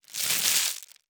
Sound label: Ultrathin Cellophane, crumble, quick, full spectrum, crunchy, delicate, crispy, collapse, light; Vaeyan I